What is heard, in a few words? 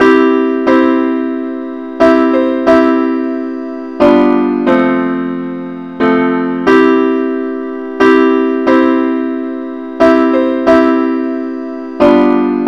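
simple accompaniment key C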